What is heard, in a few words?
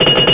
metal clank; Undercroft